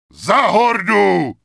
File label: Grunt Warcry; wowpreklad; czwow